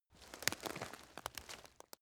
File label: step leaves branch crack; schritte